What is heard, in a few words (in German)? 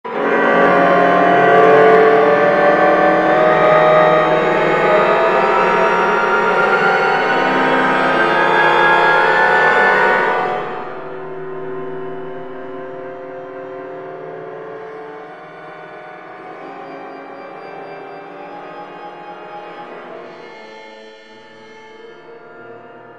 roehrende hirsche praepklavier; Algorithmische Kompositionen; kramann